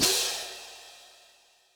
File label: H Cym, Drum Machines, Yamaha DTXpress, Cymbal